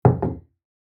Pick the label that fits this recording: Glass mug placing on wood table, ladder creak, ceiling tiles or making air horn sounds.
Glass mug placing on wood table